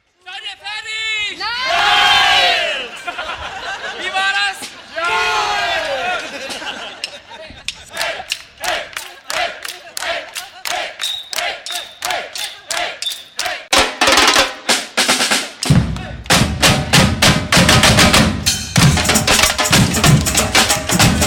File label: Bhangra Dhol; Drum Kitchen Berlin